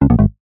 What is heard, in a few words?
multimedia game tone error retro